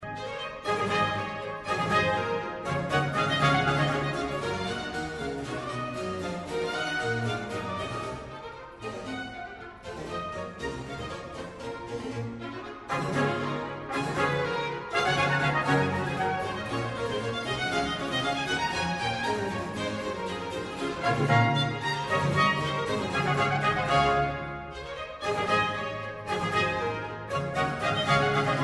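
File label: orchestral suite no 3 d major bourree; bach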